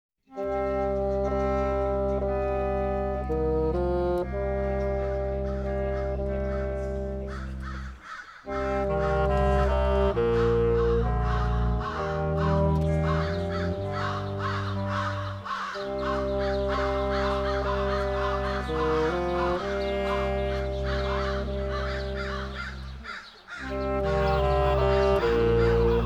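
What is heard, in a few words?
music and ambience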